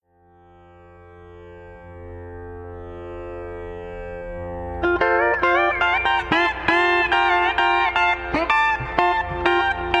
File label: Bouncy drone, pedal steel